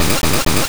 Super Fang; Pokemon; Gen 2; attack moves; GSC

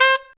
C2 Sax

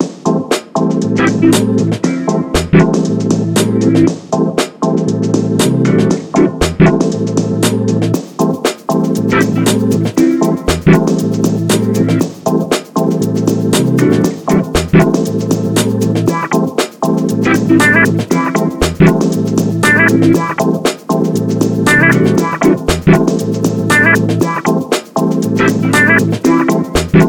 Attic; Frogger in Toy Town

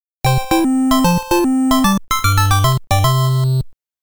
BATTLE CLEAR; Ganbare Goemon Sound Treasure Box

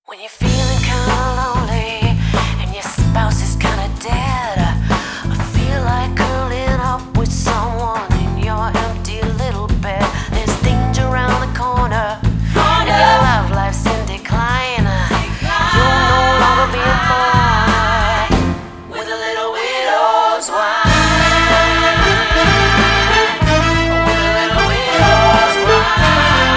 Widow's Wine Jingle; Call of Duty Zombies

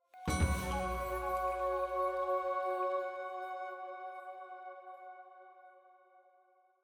item Jingle Full+ Choir Flute F sharp

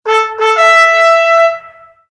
objective completed

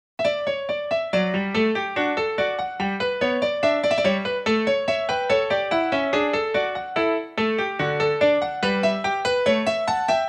I like Piano (arrange)

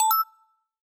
Notification

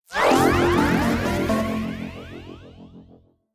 Item Fanfare; Metroid Prime 2D

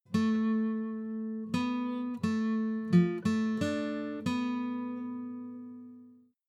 (Guitar); Majora's Mask 20th Anniversary Tribute

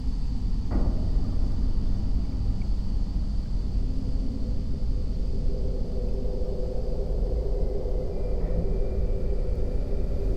Ambient

